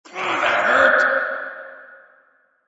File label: ow hurt